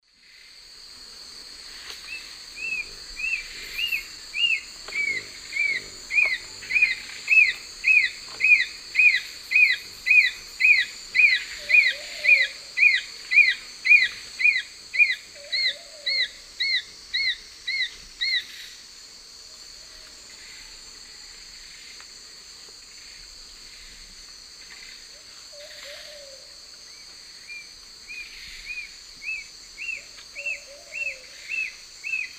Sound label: Nonnula brunnea, song; S. America; Eastern Lowlands